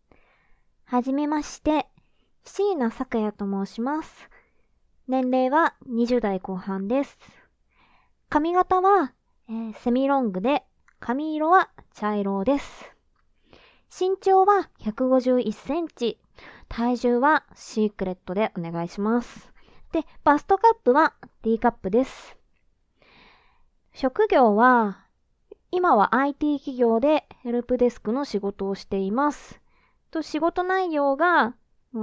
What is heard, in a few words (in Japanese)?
オナニー実演, パンツ濡れ濡れ、くちゅくちゅ音立て、お汁を漏らしながら絶頂; ぶらっく&ぼっくす; 女性视角 自慰 羞耻/耻辱 记录片/体验谈